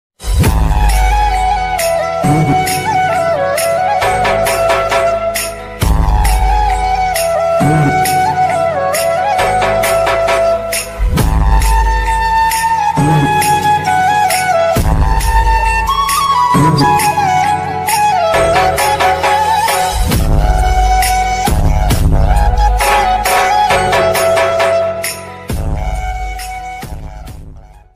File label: bhakti ringtone; durga puja special ringtone; navratri